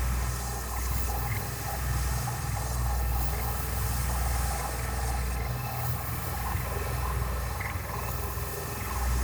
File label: Energy Field Loop; Hardened Wellspring; Warframe; Focus; Zenurik